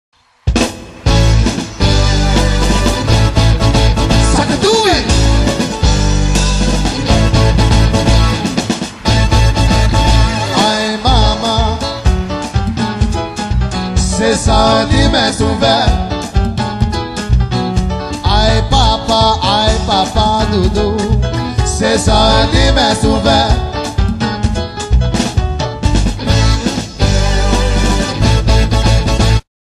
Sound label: Exobeat